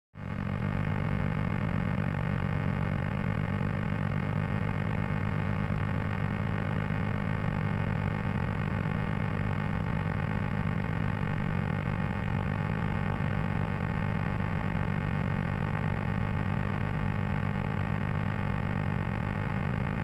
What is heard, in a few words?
pad; conciliabule